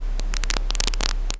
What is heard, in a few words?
sfx needledrop; vinylgod